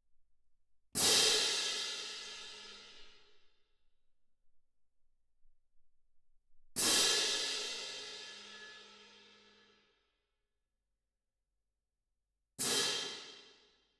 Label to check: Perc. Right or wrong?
Right